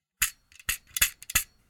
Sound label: small phone holder spring hits